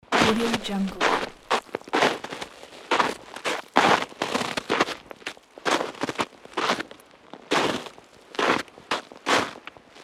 walking on snow and ice

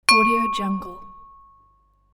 pling ding

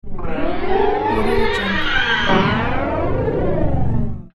heavy stretched text flange; hollywoodedge

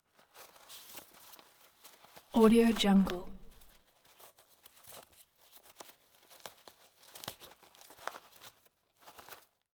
lacing boots; collanderson

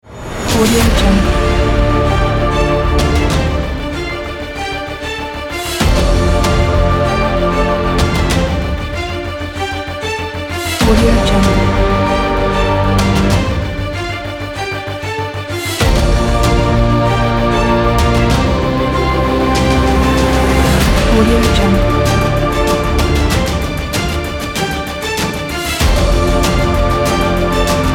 epic cinematic trailer

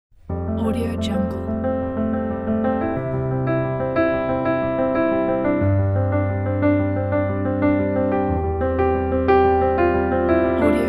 romantic; hitslab

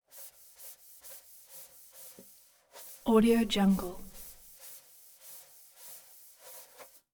scrubbing metal; collanderson

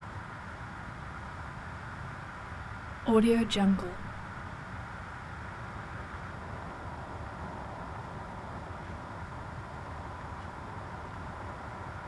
2000 ford focus car ext; prosoundfx

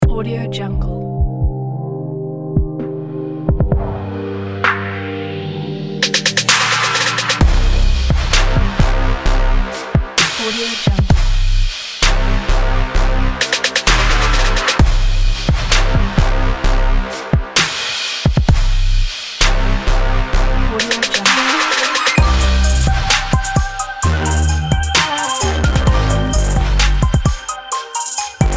upbeat anthemic trap

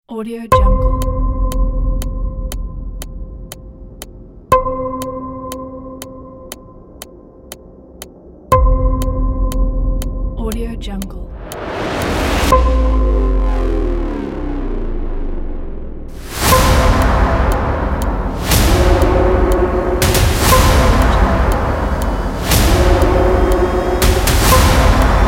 action trailer; demetra

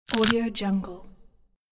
bone break snap; prankaudio

